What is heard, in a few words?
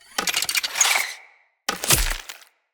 Bow Finisher Ground; Sinecure